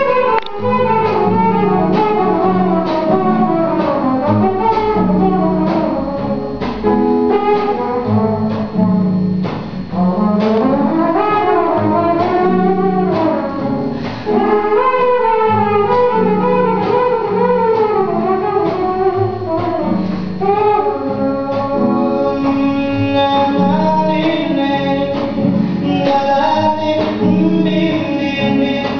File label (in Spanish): trompeta de boca